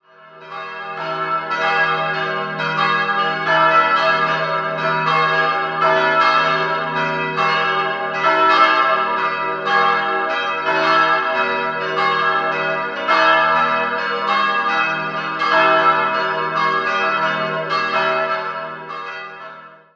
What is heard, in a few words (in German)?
Ingolstadt Markus, Ev. Markuskirche, Glocken; im Süden Ingolstadts